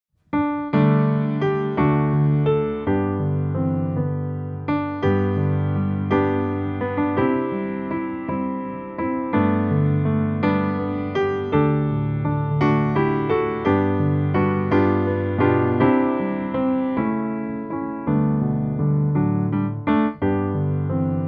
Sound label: Backing; iniciantes